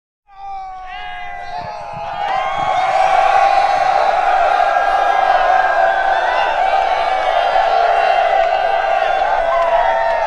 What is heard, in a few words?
Infantry Roars 1000 Men Roar; Historical; Hollywood Edge Historical Series; War Horses Armies & Weapons